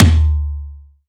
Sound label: Acoustic Drums - Tom Middle; Antidote Advent